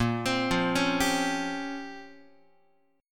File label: Bb Minor 6th Add 9th Bb down Guitar; arpeggiated; JGuitar